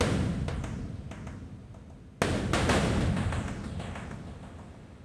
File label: Noise percussion 95bpm